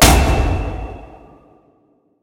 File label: hit large; smite; god odin